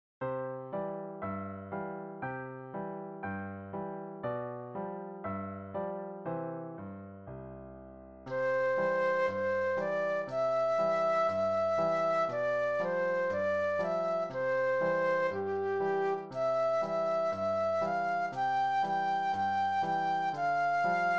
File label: Flute Solo